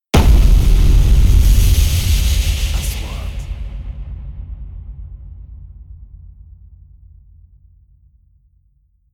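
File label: 3D SFX Trailer; مؤثرات تشويقية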